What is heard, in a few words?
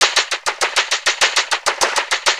Vocobeat; Sonic Foundry (Sony Creative Software)